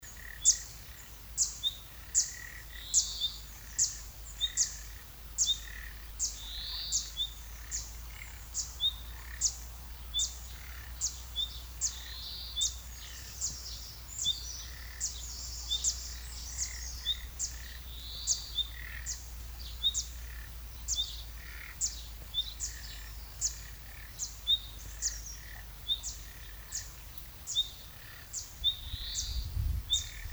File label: bird recording